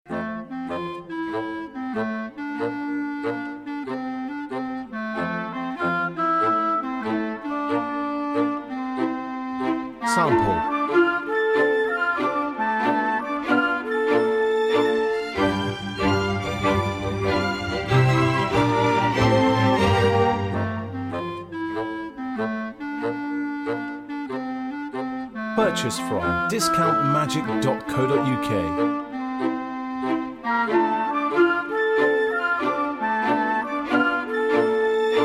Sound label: classical